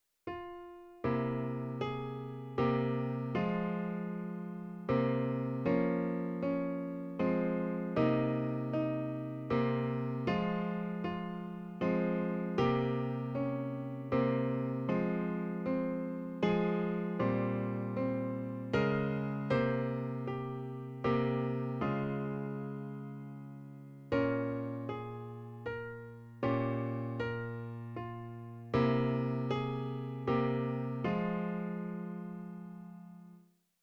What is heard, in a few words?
rising intervals sad novoice